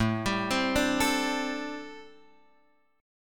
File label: A Minor 11th A Guitar Open F; Am11 arpeggiated; x,4,7,7,5,4; JGuitar